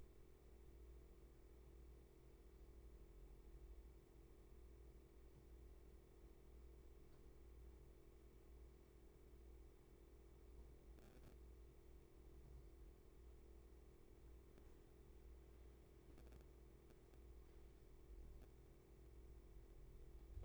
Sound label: roomtone; Holubcova